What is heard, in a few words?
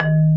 MARIMBA D#2